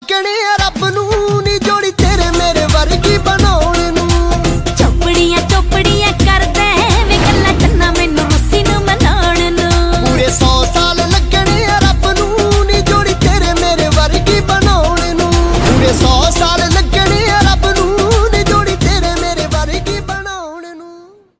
Remix, ringtone

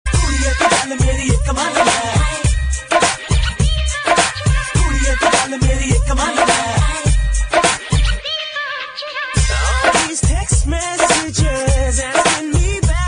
hindi remix ringtones

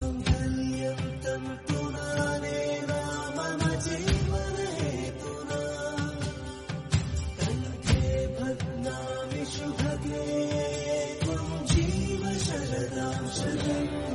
Wedding Anniversary Tamil Songs

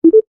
bleep sound

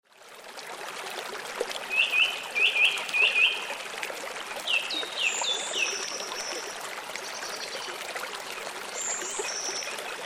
birds chirping and water flowing

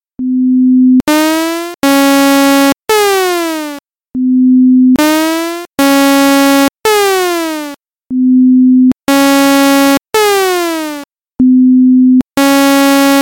alarm clocks

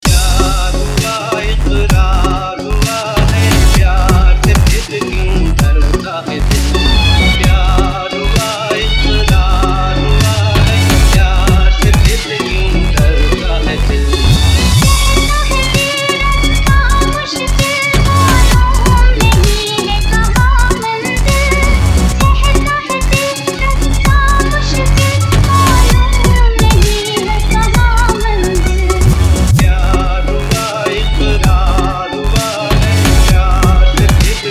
remix

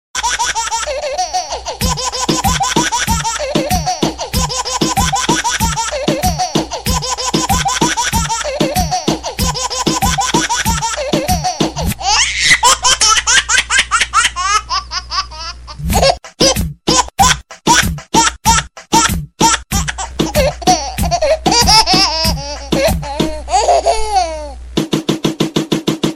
baby hip-hop

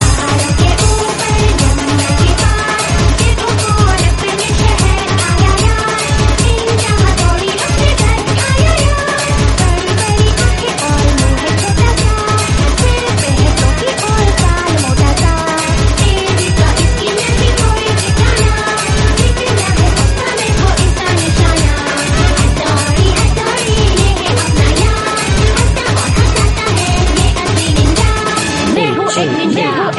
Theme Song In hindi; Ninja Hattori

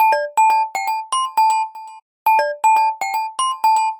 samsung ringtone, samsung galaxy s5 ringtones

